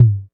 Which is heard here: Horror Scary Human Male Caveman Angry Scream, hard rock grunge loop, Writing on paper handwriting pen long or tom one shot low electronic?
tom one shot low electronic